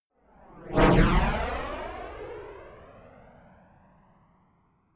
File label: rocket hydra flyby whoosh fast right to left close